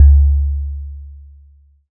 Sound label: Udu; resynthèses